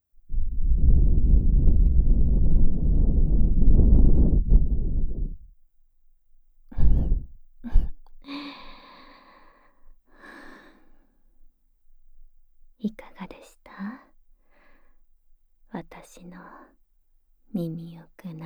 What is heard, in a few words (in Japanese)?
耳“奥”舐め犯し × 両耳“濡れ”耳かき; 騎乗位の性感帯責め